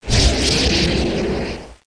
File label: missile launch big; a-10cuba